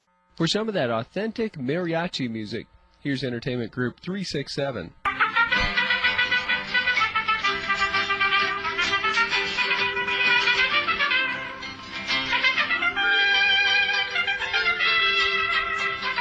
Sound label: mariachi; livemusic